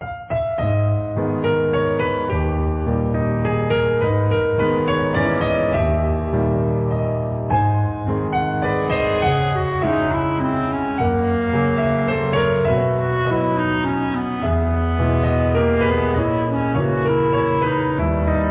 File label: sadness very sad